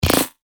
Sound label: Stinger, percussion; revealed percussion vol 2